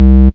DM BAS, Dance Mega Synth; Best Service; 90 s Sample CDs

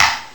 HC-2 Clap, Boss HC-2; 300 Drum Machines

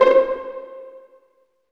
STR PIZZ, String Sections, Violins Pizz; Vls Pizz; Roland LCDP13

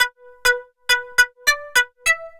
SYNT, funk

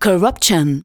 vox, Techno Trance; Ueberschall

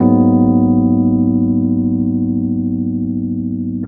RHODES; Houseworx

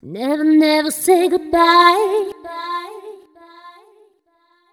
f 128 mel e, fem Vocals

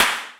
rim, Roland MC-909

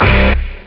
dist hit; jazz jackrabbit 1; M8

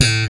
6 STR FUNK; Spectrasonics; Bass Legends

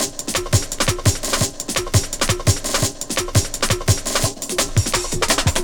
Drum & Bass Beats & Breaks; Sonic Foundry (Sony Creative Software) - Fluid Dynamics Drum & Bass

